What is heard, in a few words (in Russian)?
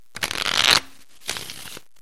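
умелая перетасовка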